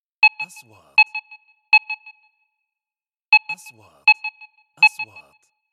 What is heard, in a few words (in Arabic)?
نغمة تنبيه الكتروني; transitions